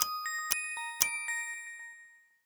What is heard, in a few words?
power on wearable